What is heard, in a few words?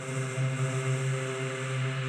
Vocal Grains; Pads; BB 2HTC Samples Vol 4 Addendum